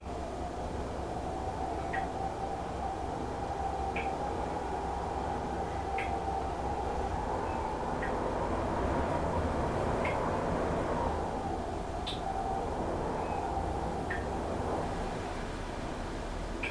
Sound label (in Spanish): VIENTO Y GOTA DE AGUA DESDE INTERIOR BAÑO CARRETERA PARACAS; Ambiente; lluvia